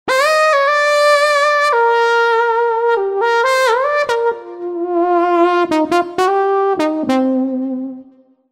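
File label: Analog Lead; TURBO VL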